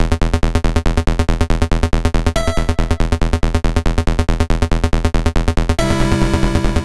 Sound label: Synth Em, 140 BPM; loops; CHIPSHOP DELUXE